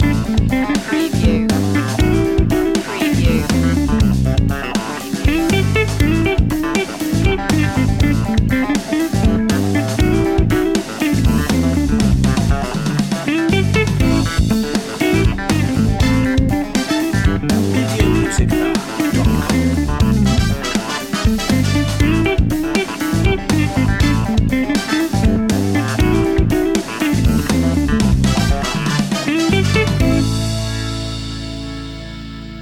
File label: funk soul king